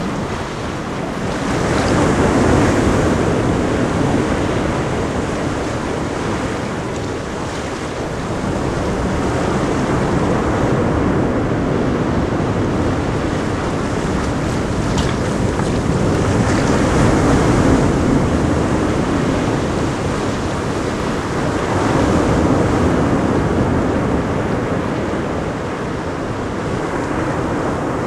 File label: ocean waves